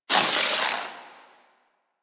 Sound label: Melee Heavy Air Slam Impact Ice; Weapons; Whooshes; Warframe